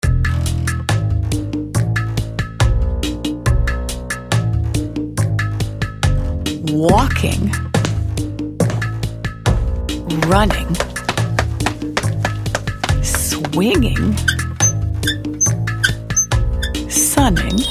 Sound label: action chant